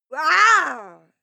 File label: female aggressive snarl; schreie; menschen